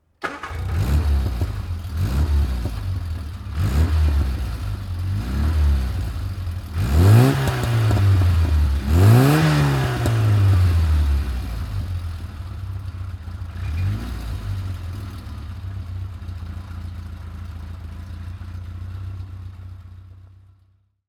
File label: Innocenti Mini De Tomaso; classic car